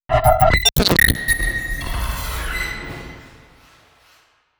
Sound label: portal glich